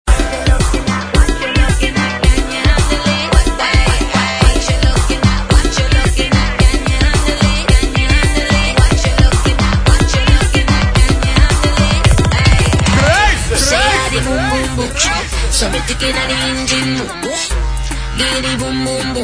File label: oil drum